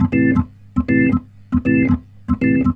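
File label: organ, B-3, 80 bpm, roots reggae; Sounds Good - Roots Reggae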